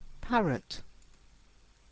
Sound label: parrot